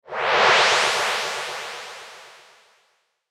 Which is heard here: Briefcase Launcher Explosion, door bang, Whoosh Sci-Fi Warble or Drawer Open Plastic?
Whoosh Sci-Fi Warble